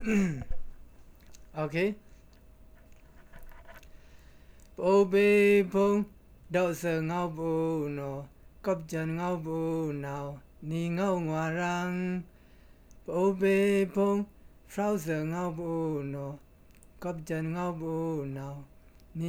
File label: Paoh Khwuh Song